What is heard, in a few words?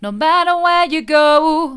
mid, vocal